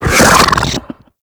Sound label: Infested Ancient Run Breathe, Enemies; Warframe